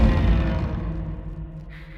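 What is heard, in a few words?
Music Volcano 120; from dust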